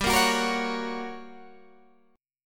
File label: G Minor Major 7th Flat 5th, G, down